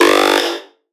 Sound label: synth shot G; Electro; Anthemic; synth shots